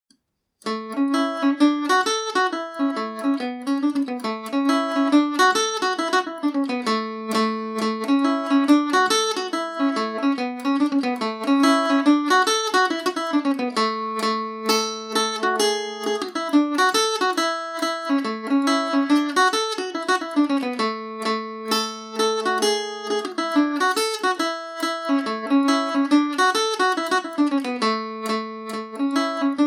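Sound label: slide, A major, irish mandolin; mick duggans slide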